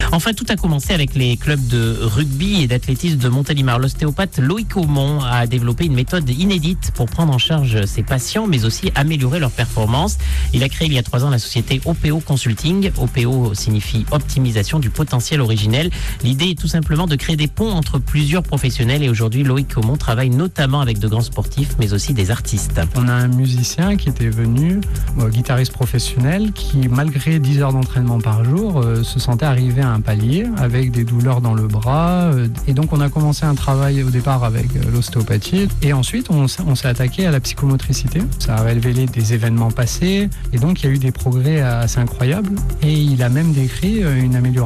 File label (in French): musique